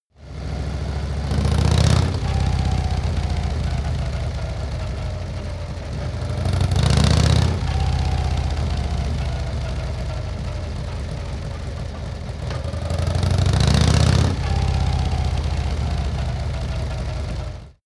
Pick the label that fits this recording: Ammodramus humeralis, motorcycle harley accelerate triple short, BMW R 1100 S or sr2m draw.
motorcycle harley accelerate triple short